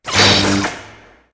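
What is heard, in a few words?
Light Saber wall, Contacts avec d'autres sabres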